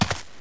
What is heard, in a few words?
footstep dirt